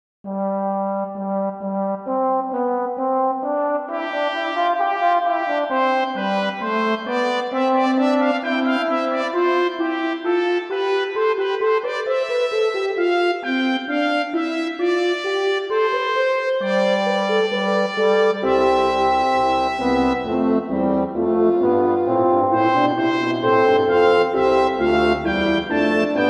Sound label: brass; UVI